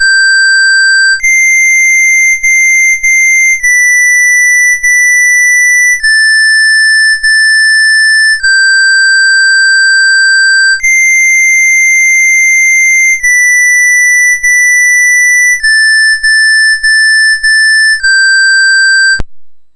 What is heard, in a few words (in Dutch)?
Daar kwam ene boer uit land, MOS6581R4